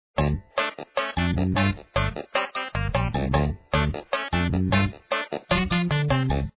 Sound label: bg intro; korean miner